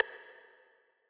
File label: Rim